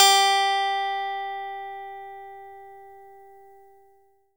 E-GITARRE, Alpine Volksmusik; Best Service

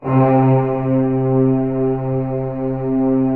orchestra C2 PP; Sound On Sound - Peter Siedleczek's Orchestra

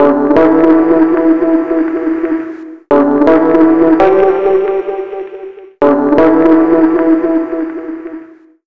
Guitars, 165, D; Total Drum&Bass; 90 s Sample CDs

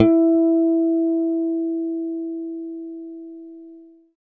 guitar, e-guitars; Sound & Vision; Gigapack I CD 2; GUI E-Guitar 3

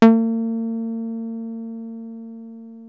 303 A3, TB 303

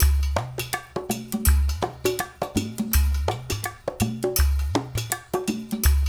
percussion loop; InVision Interactive; Percussion Slam; SAHARA

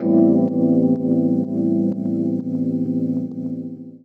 Chords Tones; Equipped Music; Loungin House

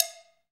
PER COW, Cowbells

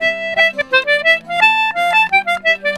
C4 L, Celtic Flavours; USB Soundscan vol.42; AKAI

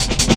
helicopta cuts, drum loops; Zero-G Jungle Warfare 1, 2 & 3

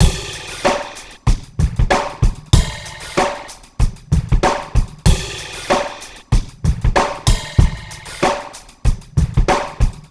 Street Tip, R&B Drums

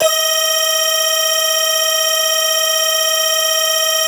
synth clav

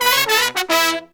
Horns, 120BPM

